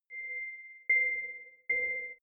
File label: sci-fi creepy sonar short